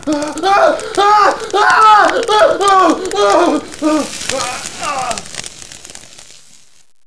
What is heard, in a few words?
burn